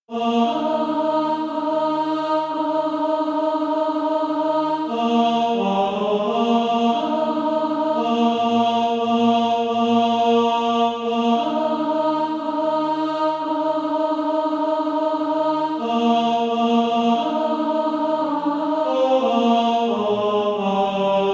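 tenor, Sheet music (SATB) including Practise Music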